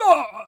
male damage

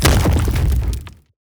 Armor Break; Damage

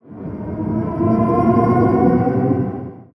Power On Heavy

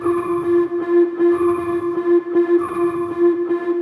Reactor Overheat Alarm

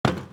Footstep Metal; Footsteps

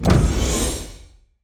Door

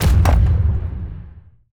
WEAPONS Flak Gun

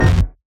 burst; Watcher

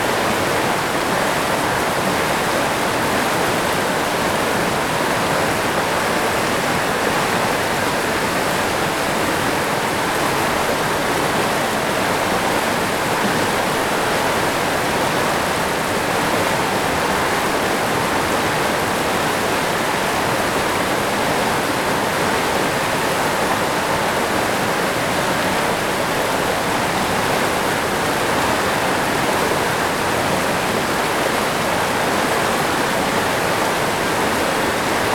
Flow Large, Water

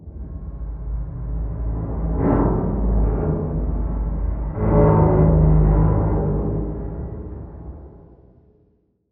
Hull

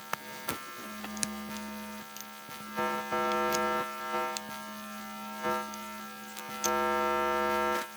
Rewiring Loop

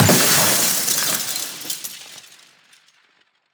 Glass Break; Damage

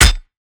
damage guardian pod impact; Alien

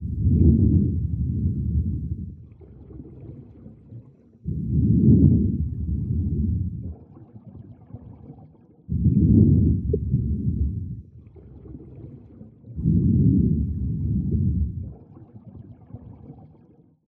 Diving Suit Loop

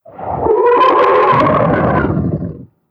THRESHER death; Tiger thresher

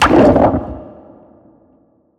organs; Thalamus; CARRIER

